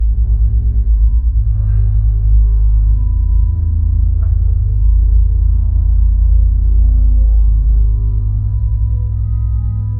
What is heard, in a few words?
low volume local bar ambi